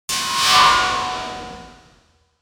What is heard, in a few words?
metallic scrape attack; clipping